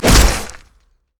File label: basic attack knee; rengar